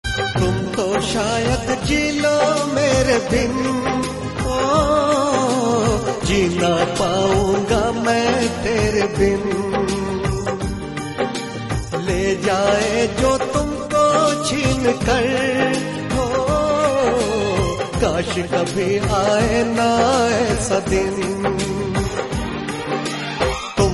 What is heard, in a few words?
dard bhara sad song